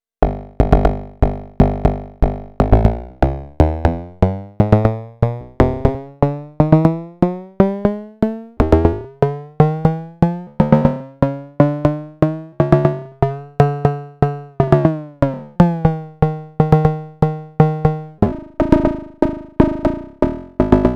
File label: FM Drum; Arturia DrumBrute Impact